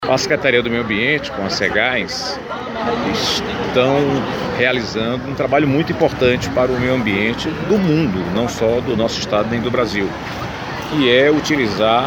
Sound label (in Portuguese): AMBIENTE